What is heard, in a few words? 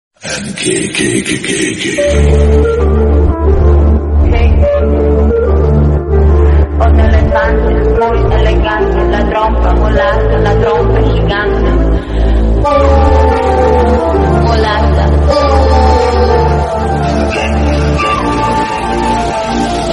attitude ringtone